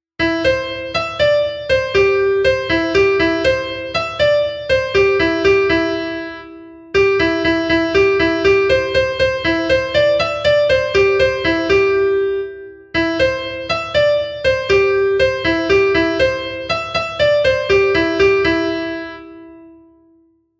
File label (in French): Lance, trompes